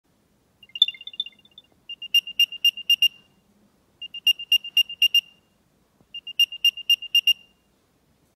Apple Airtag Sound

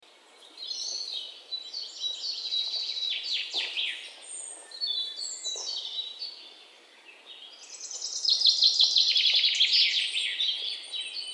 Birds chirping

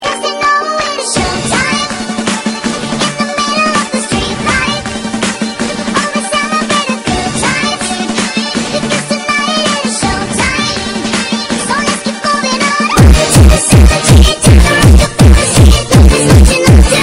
Nightcore Mix Bass Boosted

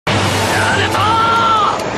Anime Song

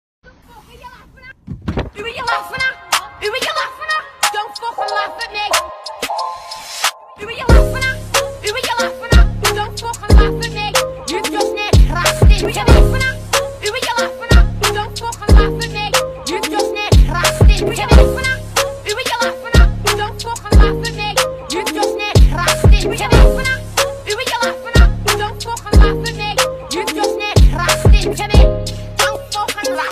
Laughing Remix; Tik Tok

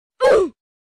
Death Sound Effect; Roblox

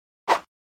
Sword Slash; Roblox

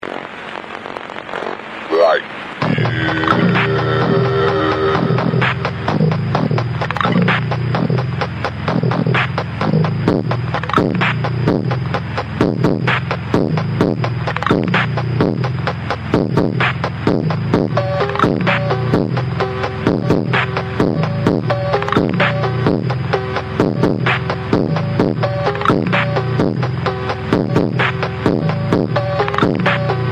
CowBell; Goth

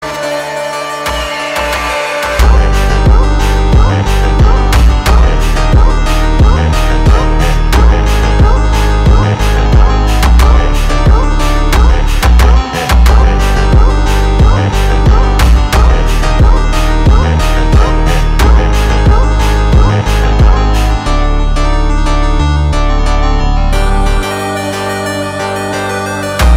brazilian funk

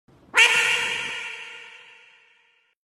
Disappearing Quack

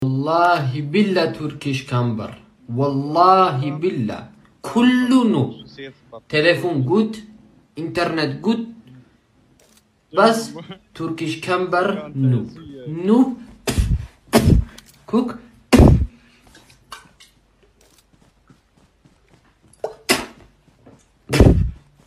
Turkish Camper Sound Effect

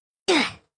Duh Death Sound Effect; Roblox

